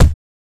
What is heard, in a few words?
Short foley